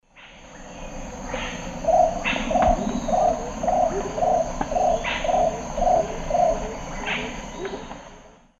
Capito aurovirens, song; S. America; Eastern Lowlands

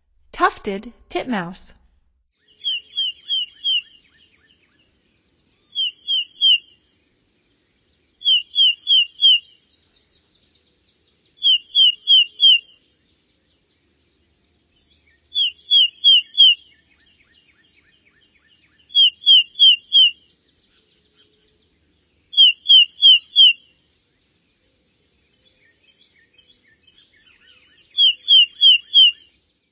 Tufted Titmouse, Songs of Birds of North America; Stokes

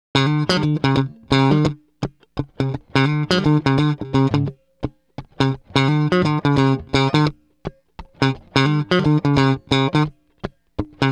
Bass, RnB

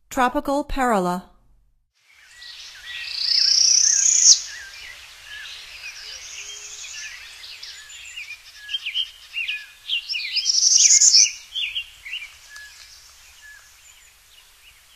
Tropical Parula, Songs of Birds of North America; Stokes

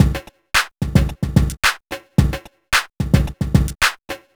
r n b kit 110

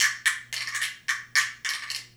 ESSENTIAL PERCUSSION

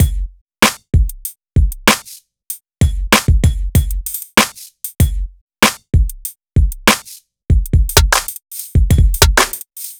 Timecode, Hip-Hop, 96

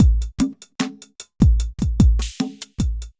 evil beats 75

